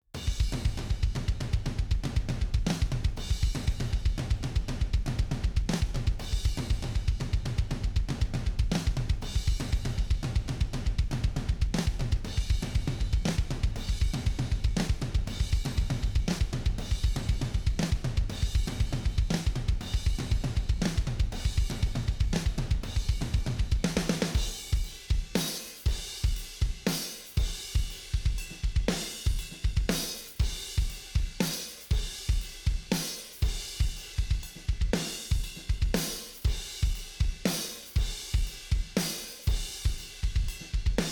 drums

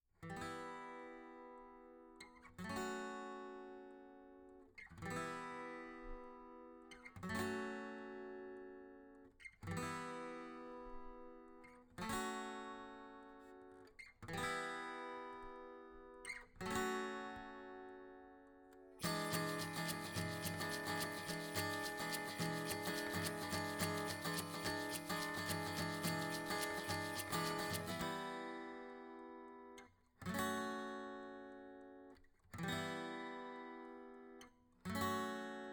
perc, acoustic

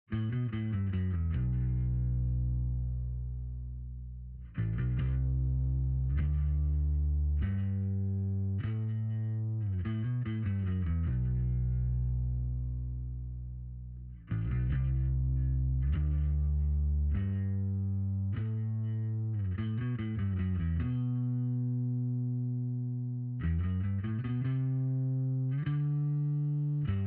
bass

